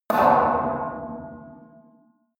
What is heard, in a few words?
metal impact bar bell large